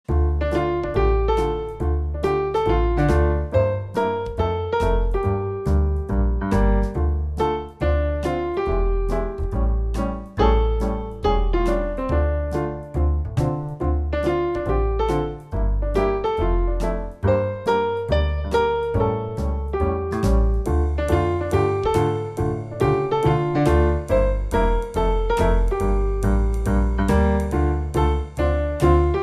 jazz

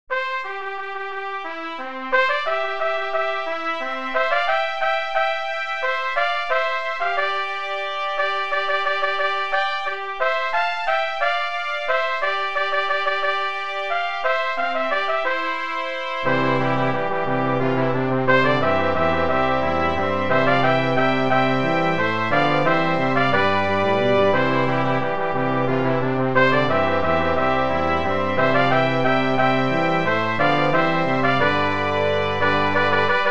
minuet pour trompettes trombones tuba; handel george frideric

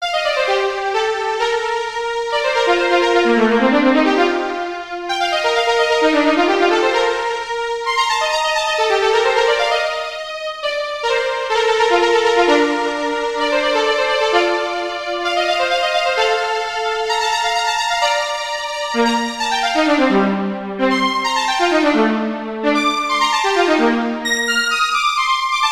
exercice pour violon solo; chauveau bruno

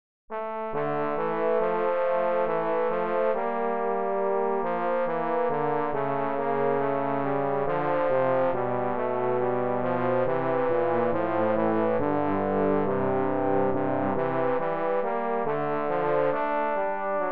duo pour trombones avec accompagnement

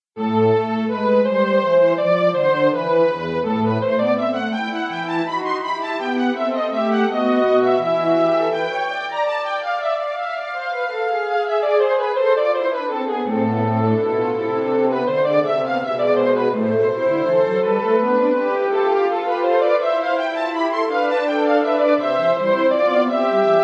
cordes; bach johann sebastian; 15 inventions a 3 voix

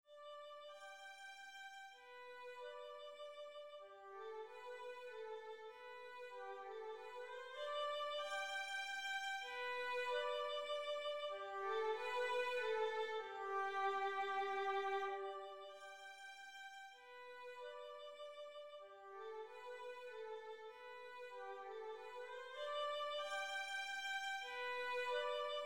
menuet sol

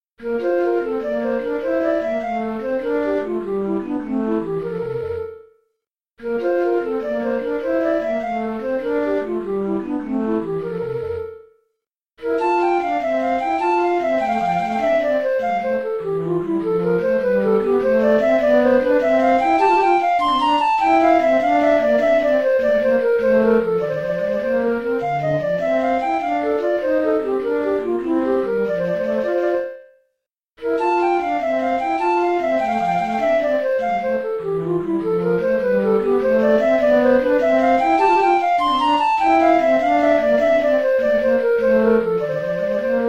gigue en mol majeur

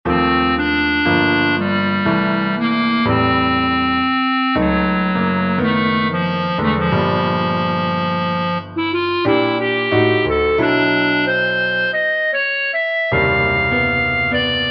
piano seul; jean frana ois xavier; liquescents

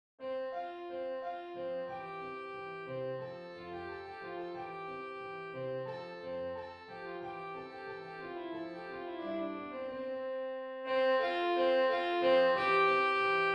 gavotte majeur; Arcangelo Corelli

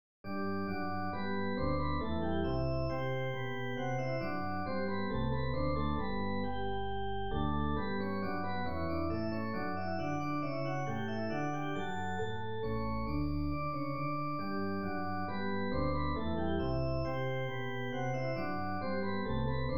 boura sol majeur; haendel georg friedrich

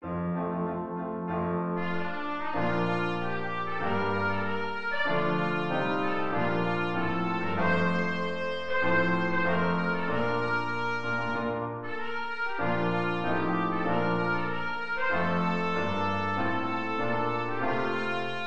hymne national de Belgique

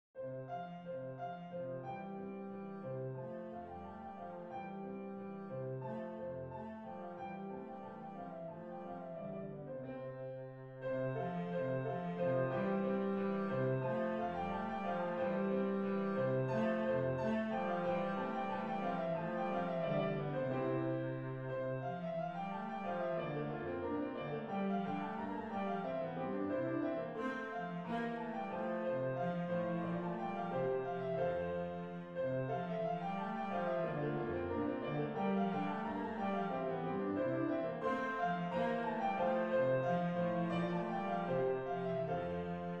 gavotte majeur; corelli arcangelo

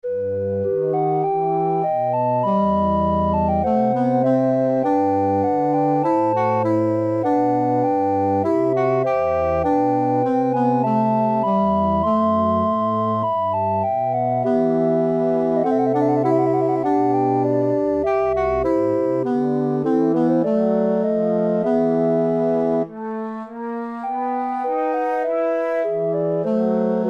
arias pour orgue

